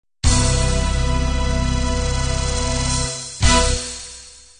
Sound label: guitar ballad end